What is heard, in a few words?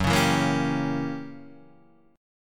Gb 7th Flat 9th Gb down Guitar Standard; strummed; JGuitar